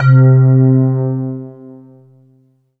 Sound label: E-Mu Proteus FX Vibro Pad C3